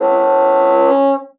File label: MULTI, bassoon; Xsample VOL-6